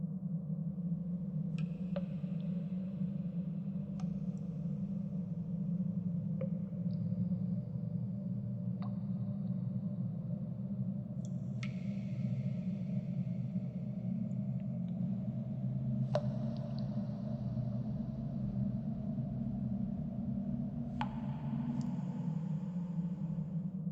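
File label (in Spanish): Ambiente galerias, tunnels ambience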